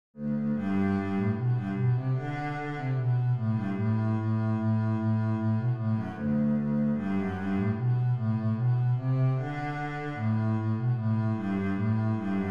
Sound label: simple gifts bass, string bass